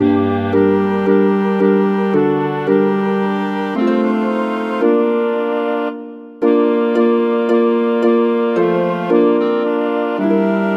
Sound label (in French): pour quatuor a vent et harpe; purcell henry; dans ces bosquets delicieux agreable